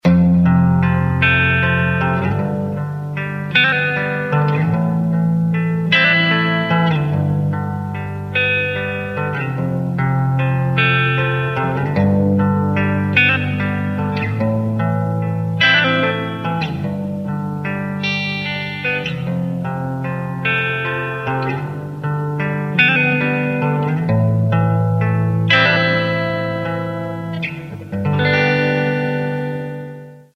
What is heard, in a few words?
bridge clean; hammer head